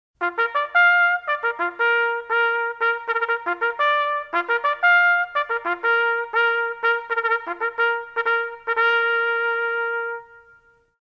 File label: Sick Call, Army